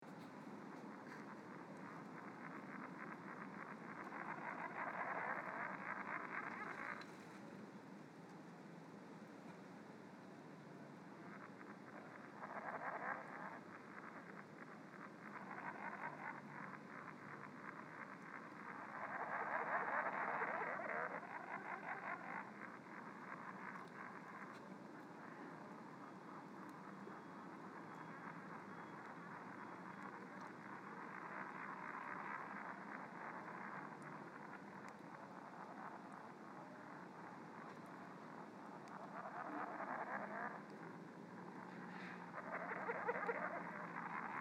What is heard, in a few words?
Frogs